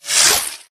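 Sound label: Drone Movement Turn; Titanfall 2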